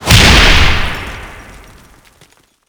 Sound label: rocket explode